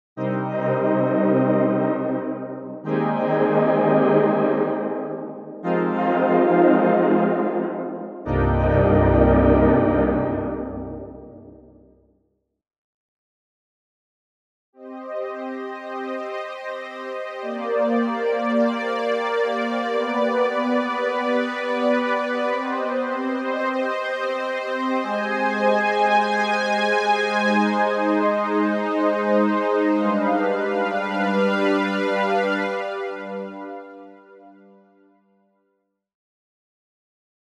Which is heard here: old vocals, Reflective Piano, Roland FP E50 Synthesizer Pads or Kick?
Roland FP E50 Synthesizer Pads